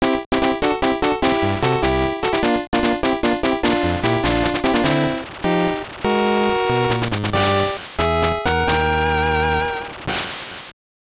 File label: Jingle; Pocket GI Stable; GBC